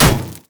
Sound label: axethrow land; pentakill olaf